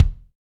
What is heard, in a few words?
BD, DR BD; Best Service Gigapack 2